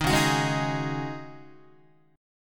Db Suspended 2nd Suspended 4th Db Guitar; strummed; JGuitar

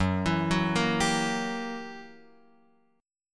F sharp 7th Flat 9th Guitar Open F, chord; x,1,4,1,1,2; arpeggiated

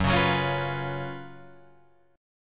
F sharp Major 7th Suspended 4th, Guitar, Drop D; chordshape; down

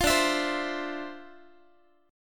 D Minor 9th, down, Guitar; Dm9; strummed

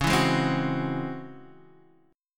C sharp Minor Major 11th, Guitar, Drop D

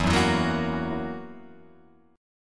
E Suspended 2nd Flat 5th E down Guitar Open F; strummed; JGuitar

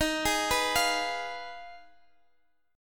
Ab Minor 6th Eb

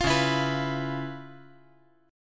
C sharp 7th Sharp 9th, C sharp, down; strummed; 5G,4C,5E,5A; C#7#9; JGuitar

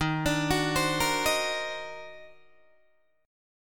Eb Minor Major 13th, Guitar, DADGAD; EbmM13; arpeggiated

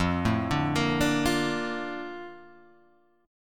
A sharp Major 7th

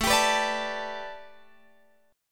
A 9th Suspended 4th, down, Guitar, Open F; A9sus4 strummed

